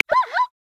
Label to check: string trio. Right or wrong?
Wrong